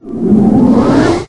Magic; life of a naomi; Naomi Carrigan

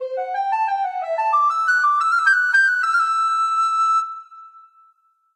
Musical; life of a naomi; Naomi Carrigan

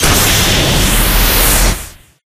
Battle; Life of a Naomi

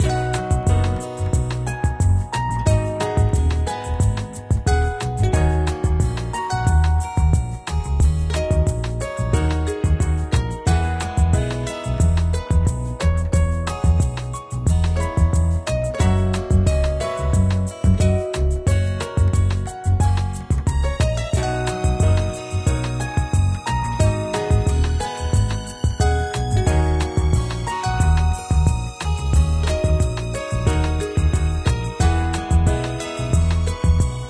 Town; Naomi Carrigan; ruu goblin quest

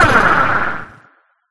Down; Life of a Naomi

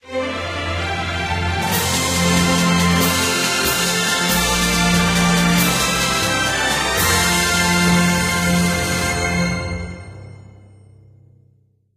Fanfare; Life of a Naomi

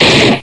Slash; life of a naomi; Naomi Carrigan

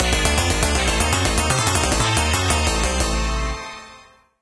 Curse; Life of a Naomi

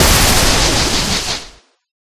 Down; Life of a Naomi

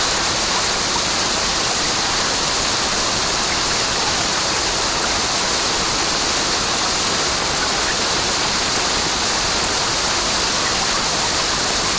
Rain; Life of a Naomi; bgs

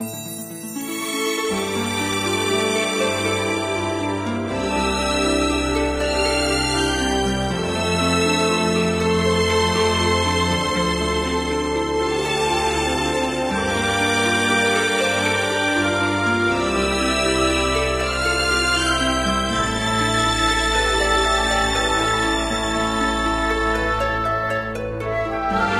Ship; ruu goblin quest

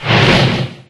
Fire; Life of a Naomi

